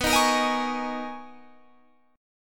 B Major 13th B, Guitar, Standard; strummed; 19,18,20,20,21,21; capo=2

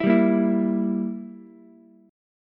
E Diminished E, Ukulele; Edim strummed; JGuitar

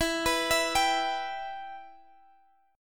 E Minor E down; arpeggiated

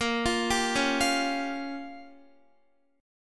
A sharp Minor 6th, Guitar; 4E,4A,5D,5G,5C,6F; arpeggiated

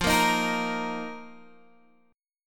F 7th Suspended 4th F down Guitar Open G

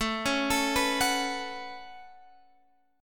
A 6th Add 9th A Guitar Standard, chord; A6add9; arpeggiated; 17,16,19,16,19,x; down; capo=9

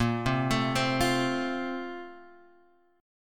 Bb Major 7th Suspended 2nd, Guitar, Open G

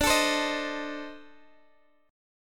C Minor 6th D, chord

